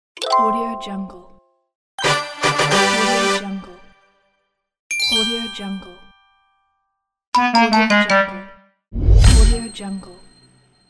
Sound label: game interface and achievement; n-audio